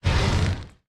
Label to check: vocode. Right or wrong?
Wrong